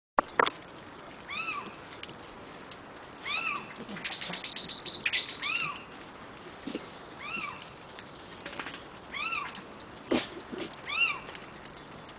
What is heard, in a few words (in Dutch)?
steenuil geluid